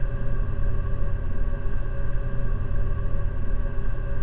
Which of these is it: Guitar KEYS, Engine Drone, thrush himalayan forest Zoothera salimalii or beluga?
Engine Drone